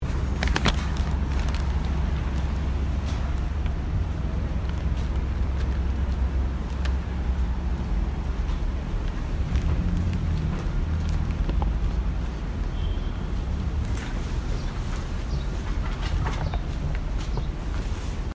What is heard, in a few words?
Oral Surgery; Greenwich Village